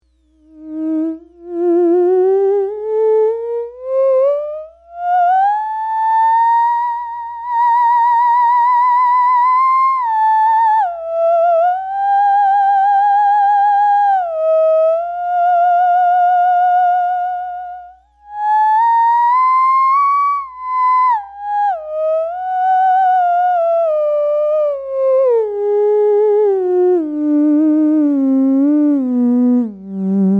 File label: spacial sound, Theremin; The Theremin Temple